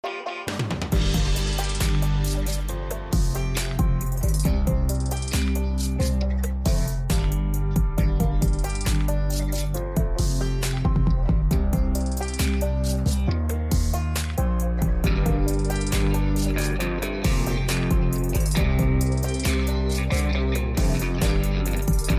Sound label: trap; Trap beat in country